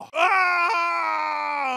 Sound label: rain scream